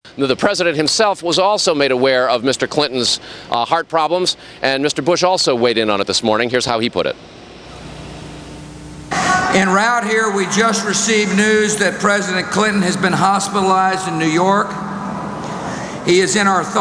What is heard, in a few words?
fake boos